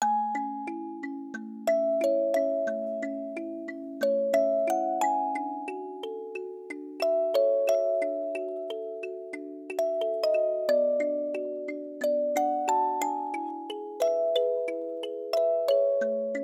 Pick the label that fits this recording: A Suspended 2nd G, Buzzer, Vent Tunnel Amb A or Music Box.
Music Box